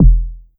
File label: Kick Breaks